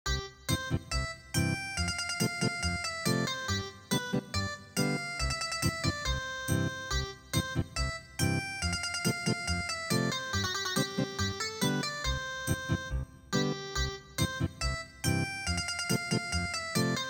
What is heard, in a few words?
Ethnic Guitar